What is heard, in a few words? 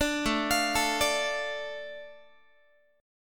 A Suspended 4th Sharp 5th, A, Guitar; Asus4#5; arpeggiated